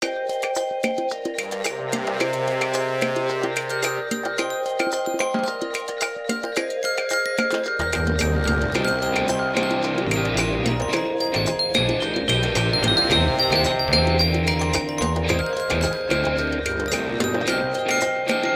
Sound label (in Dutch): Onderwaterjam